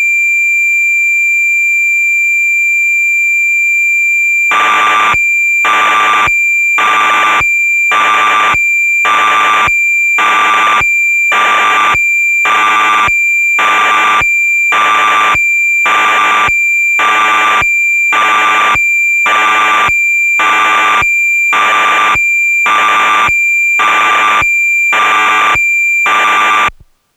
Yamaha DX9 factory presets synth, Cassette Interface; Synthesizer Factory Patches